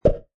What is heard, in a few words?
fall; paoPaoLong